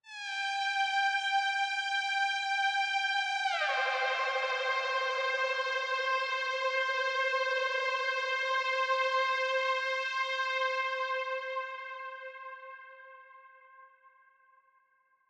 Lead Synth; Martian Chess Audio

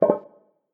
Pick Up Piece; Martian Chess Audio

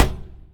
impact Plate medium

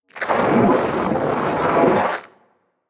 Piece Captured; Martian Chess Audio

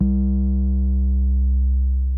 Drum BPM110; Martian Chess

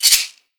Shaker

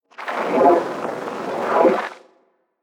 Piece Captured; Martian Chess Audio

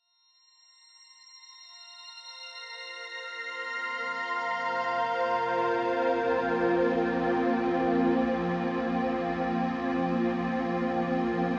Chord Ramp F; Martian Chess Audio

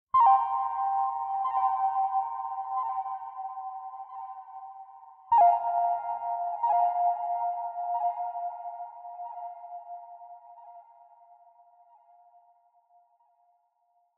Pings; Martian Chess Audio

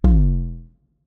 3D Invalid Move; Martian Chess Audio

